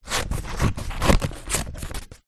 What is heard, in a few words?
office scissors cut paper; zapsplat